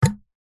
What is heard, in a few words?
household blender lid pull remove like plunger